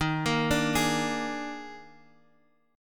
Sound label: Eb Major 7th Suspended 4th, Guitar Open D; 13,13,12,14,x,x; down